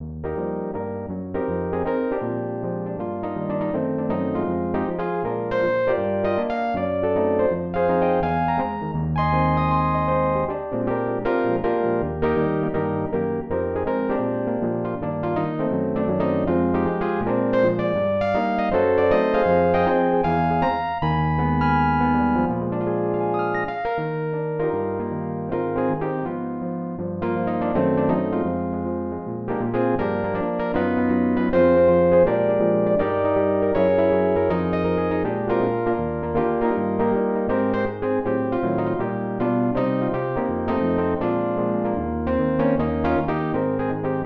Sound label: piano